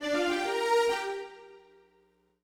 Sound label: Smooth Groove Strings, 70s Analog Funk